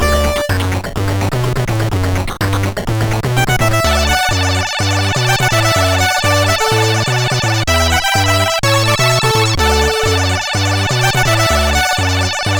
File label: Portal Radio Tune, CSG8580R5